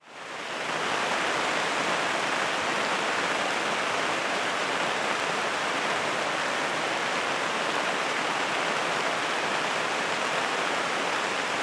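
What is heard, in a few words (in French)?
Pluie, Paysage sonore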